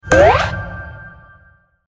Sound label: scatter trigger; Candy Bona